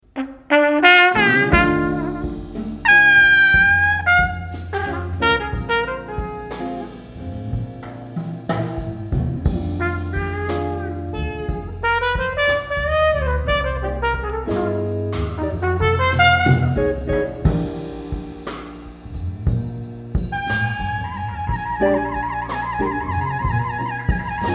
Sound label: blues